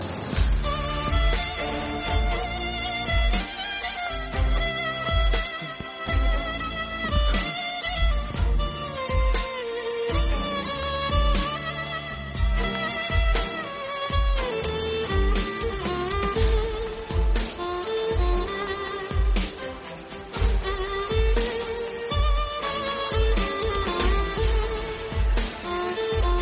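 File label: sad sad violin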